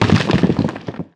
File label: Burrow